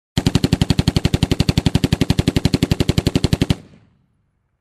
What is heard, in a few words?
Dampened machine gun shots